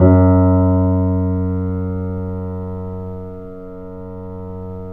The Piano, F#; InVision Interactive - The Piano; BOESINGSOFT

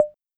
Conga Mi, Roland TR-66

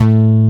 A3, BassGuitar STACK BASS; AKAI S6000 CD-ROM - Volume 1

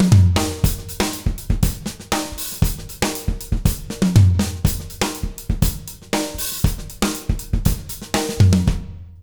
120 JROCK, Drumloops

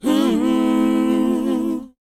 WHOA; ILIO; Vocal Planet

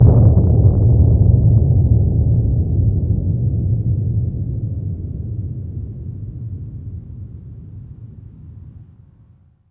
THUNDER FUZZ, SPACEY DRUMS; Spectrasonic Distorted Reality 1

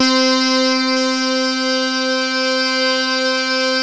CS-15, BASSES

